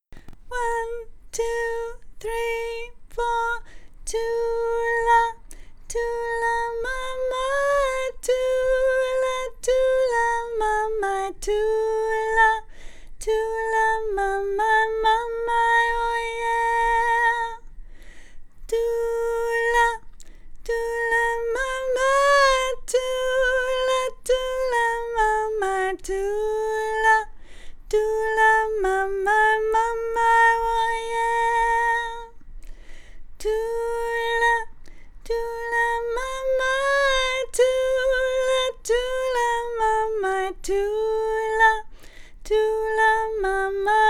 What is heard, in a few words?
soprano harmony